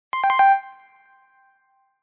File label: Correct catch coin